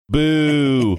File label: booing